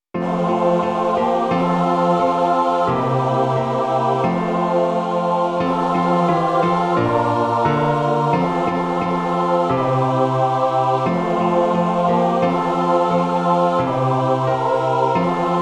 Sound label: tutti